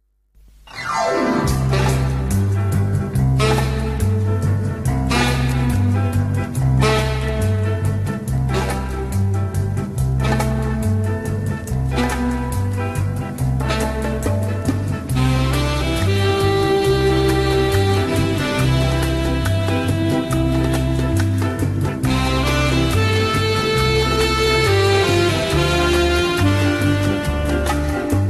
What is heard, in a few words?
rhumba